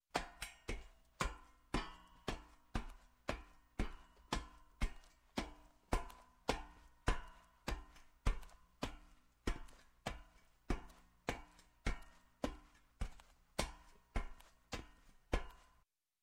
Footsteps, Metal Up Metal Steps Boots, Slow; Sound Ideas 2000 Series

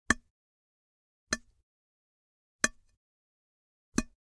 Generic Click Glass Small

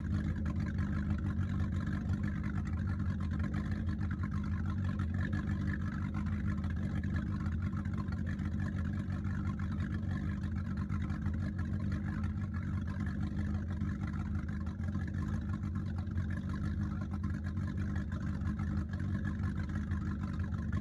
Sea Outside

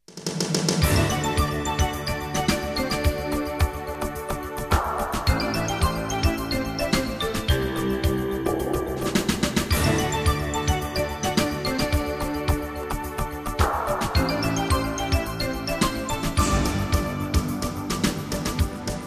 Jingles; 90 DDD Jingles

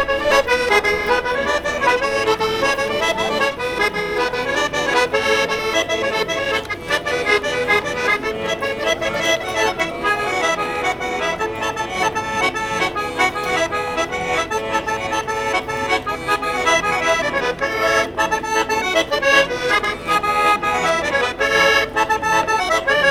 accordion hungary; best service Blue Box

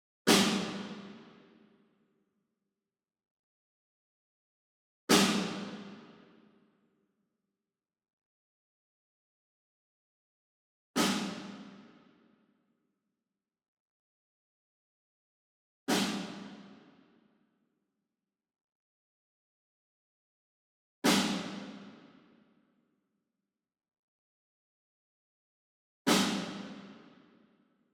SNARE Pearl High Tension Hit Far; SINGLE; boom library; Cinematic Strikes Construction Kit

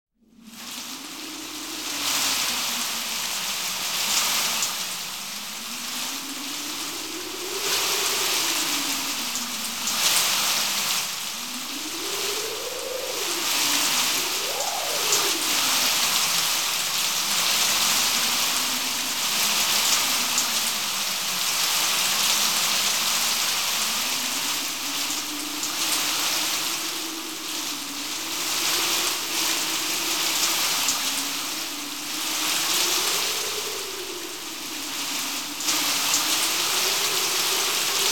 Weather Wind Swept Rain; KMP Film Video Sound Effects

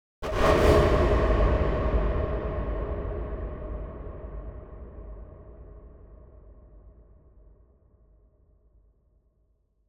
Impact Distant Dull Hit - Bad Surprise Strike; Hollywood Edge; sound designer's toolkit v2

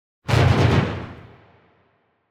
Drum Hits - Symphonic Drums - Final Hits; Hollywood Edge; sound designer's toolkit v2

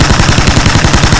Assault Rifle Shot Loop; SFX Kit; Weapons

